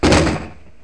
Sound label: Sound Chest Slam